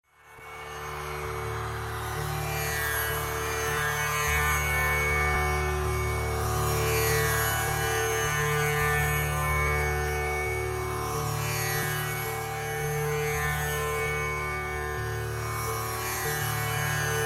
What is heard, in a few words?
Sitar Intro